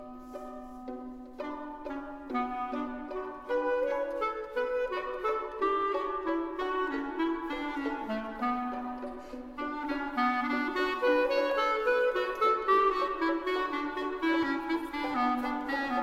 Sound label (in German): Duo Klarinette und Geige